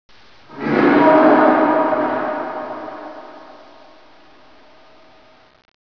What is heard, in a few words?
cave